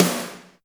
reverb rock snare drum sample b key, snare sound tuned to the B note